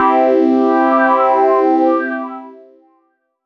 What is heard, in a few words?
Organesq Pad Chord; Big Fish Audio; OSH Chords